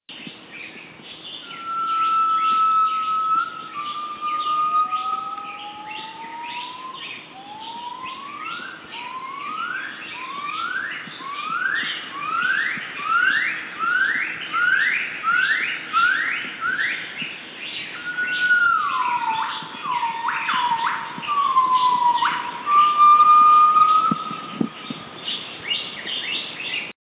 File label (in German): Gibbongesang